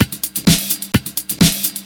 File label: Straight Loop 128 BPM, House